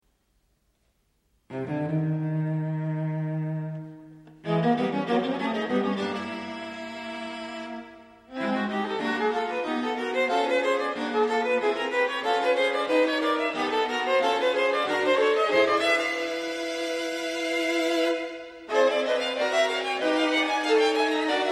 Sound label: String Quartet